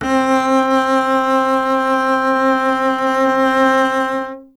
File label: STR CBS, Solo Instruments